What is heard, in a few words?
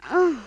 damage acolyte female